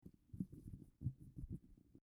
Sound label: Hand Røde S1